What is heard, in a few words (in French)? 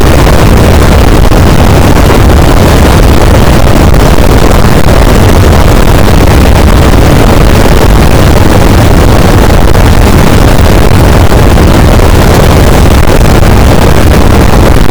cachalot negatif, boat, Physeter Macrocephalus